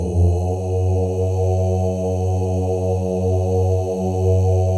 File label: male ohs; Spectrasonics; Symphony of Voices